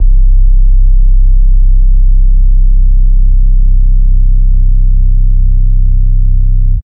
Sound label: Bass